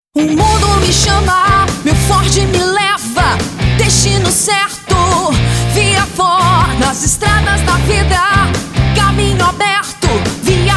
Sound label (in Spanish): JINGLE Institucional; Viafor